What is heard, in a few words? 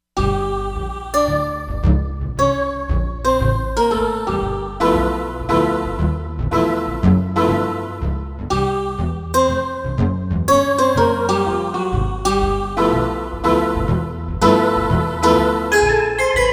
Bass Chord, Korg MSC-13S Ethnic 2